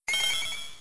shiny; P3D-Legacy

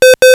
pokegear on; P3D-Legacy

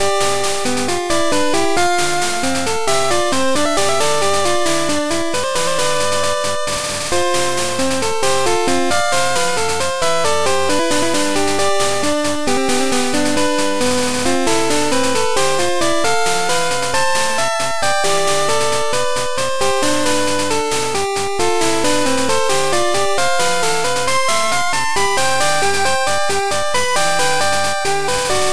Route Music; RouteMusic1; P3D-Legacy

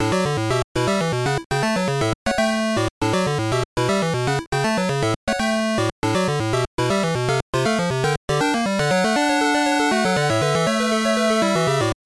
trainer defeat; P3D-Legacy

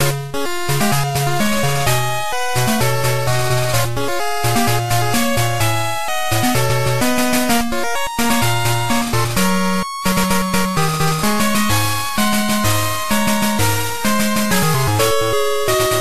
Route Music; RouteMusic5; P3D-Legacy

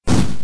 ember, Battle Effects; P3D-Legacy; 2.5DHero

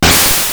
Pokeball Open; P3D-Legacy

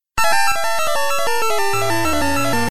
raikou battle intro; P3D-Legacy

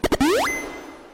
single heal; P3D-Legacy; 2.5DHero

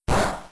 not effective; Battle Damage; P3D-Legacy

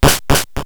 enter; P3D-Legacy

